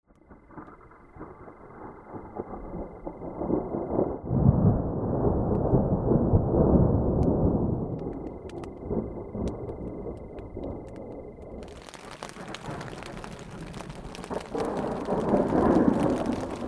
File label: Thunder; Navajo; ambisonic